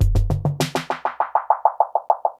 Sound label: drbreak, drumbreaks; Masterbits; Vinyl Attack